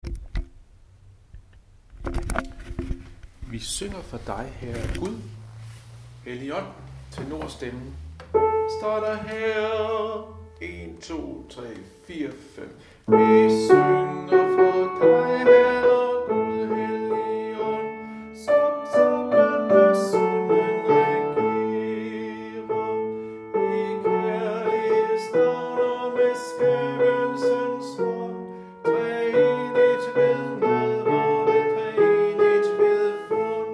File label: tenor; harlev koret